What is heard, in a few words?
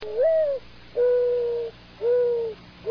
mourning, birds